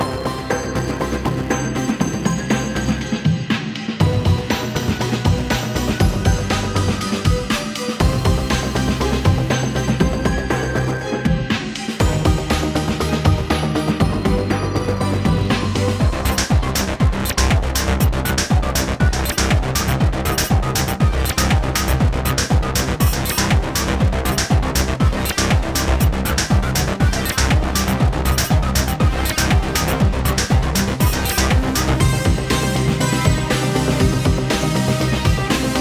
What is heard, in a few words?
theme; Furcherro